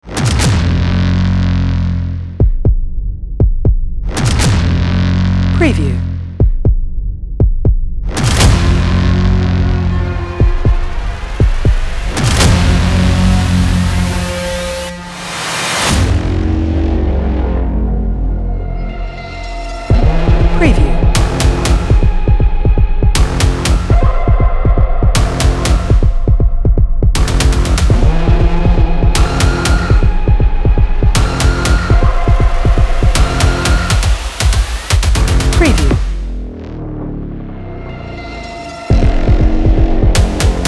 Sci-Fi Electronic Video Game Cyberpunk Soundtrack Gaming Music